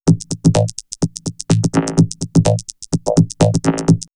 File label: Vocoder Loop; X-Static Goldmine; VocoderLoops